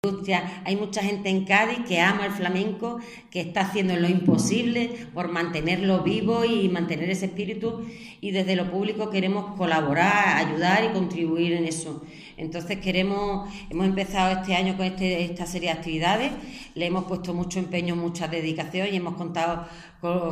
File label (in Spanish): flamenco